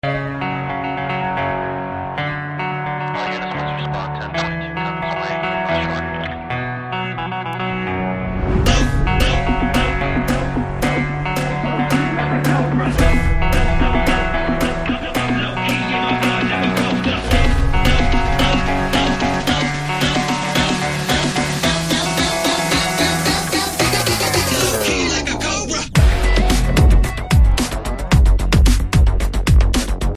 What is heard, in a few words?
BGM Theme Ringtone; COBRA Movie; Tamil Ringtones